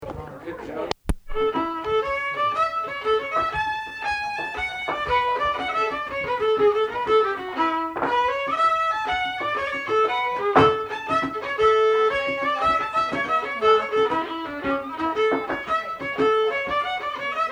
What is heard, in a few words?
fiddling hornpipe